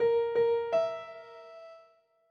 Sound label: triple bullseye alternative piano; autodarts